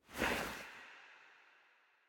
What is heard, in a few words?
Clothes; smite; izanami